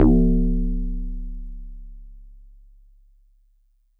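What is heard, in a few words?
Metal Tube DX100 C#1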